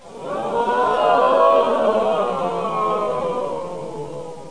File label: Loser Groan; How Would You Survive (1995); Grolier